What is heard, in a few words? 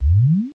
Totem Walk